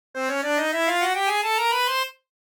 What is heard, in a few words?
Cartoon ascend